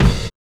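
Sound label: BASS DRUM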